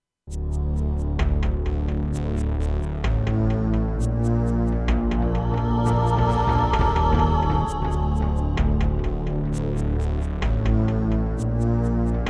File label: Ensoniq VIVO 90DB-A; Descent II